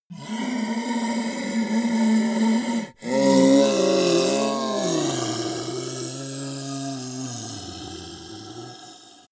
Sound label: ogre dying groan weak slow